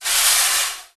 SN SLO SWSH, Ensoniq ZR-76

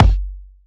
Kik; Rawcutz - Nu Forms

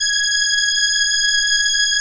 ELKA, String Machines; ELKA RHAP

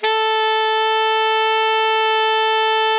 ALTO MF A 3, Sax; Greytsounds; Philip Wolfe David Crozier's Saxophones

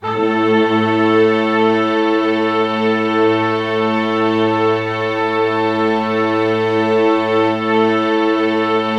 Band Orchestra A5, brass; Giga Samples Collection

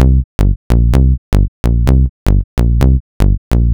Bass, 128bpm, Nu Dance Electro; Zero-G; Soundclash; Combi Loops; Monofonic

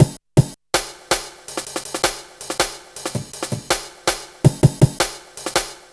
162 Dinky Break; breaks; m8 breaks

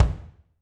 BD, Drums; Bob Clearmountain Drums I

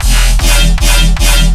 random dubstep e, bass

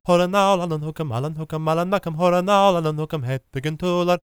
GAELIC, World Voices; ILIO; Vocal Planet

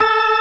STOPS, Synth and Organ; InVision Interactive; Keith Emerson; The Most Dangerous Synth and Organ; ALL STOPS

